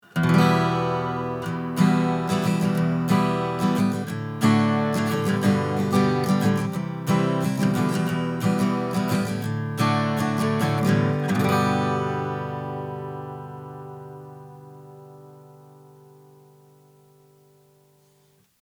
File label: Zoom H6 Coles 4038 Guitar 60cm Full Gain Preamp Test